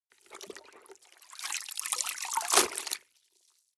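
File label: clothes water up down long, household; haus-garten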